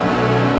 aaaahhhh; Truth-Hz - 1-Hit KO Sample Pack

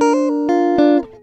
guitar; TRUTH-HZ; Crowdsourced Sample Pack

